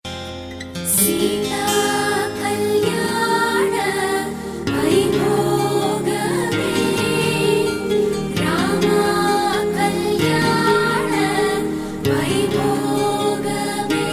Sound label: Indian Ringtone